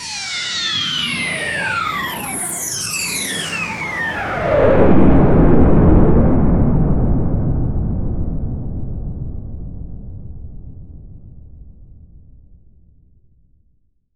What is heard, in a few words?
bomb